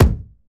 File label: bass drum single hit c key